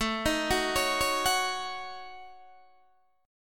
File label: A 6th Flat 5th, Guitar, DADGAD